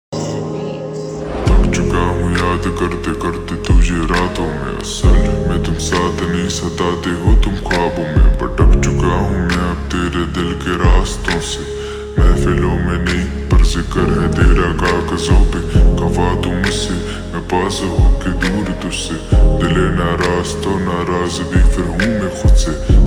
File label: Ringtone slowed and reverb